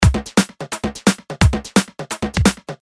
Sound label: Drum Loop